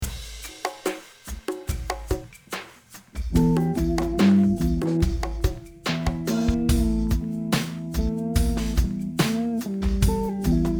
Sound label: slo funk